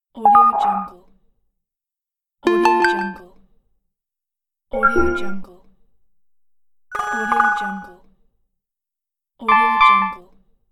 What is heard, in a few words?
system alerts pack